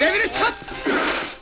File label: Chop